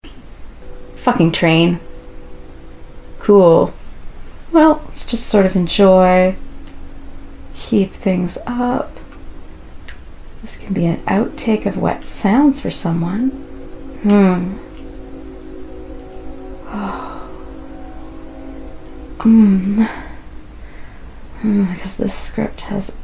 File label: wet sounds, background noise; traingasm; edging